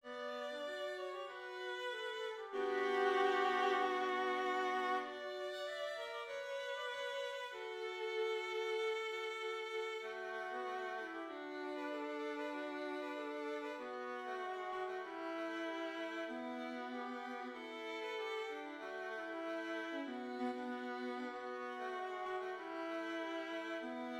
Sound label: violins; kocakov drago; study